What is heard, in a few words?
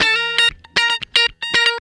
Guitar Licks